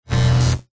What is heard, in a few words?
science fiction power surge swell weird